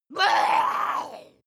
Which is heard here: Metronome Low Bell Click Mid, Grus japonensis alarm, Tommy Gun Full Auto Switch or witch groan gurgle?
witch groan gurgle